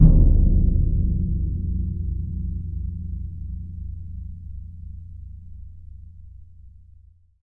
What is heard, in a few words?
BS DRM PP; ILIO; Synclavier Percussion 2; World & Orchestral